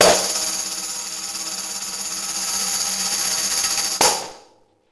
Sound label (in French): Tambour